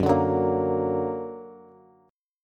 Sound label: Gb Augmented Bass; Gb+ strummed